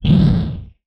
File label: Explosion; Space Blasters2D